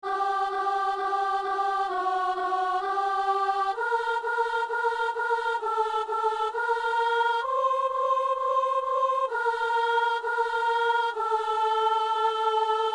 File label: soprano; Messe de San Lorenzo - Kyrie